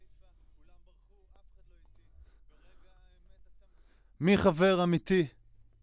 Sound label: Voc OD